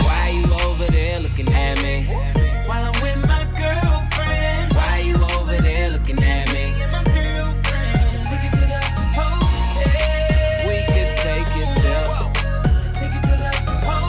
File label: funk; multitrax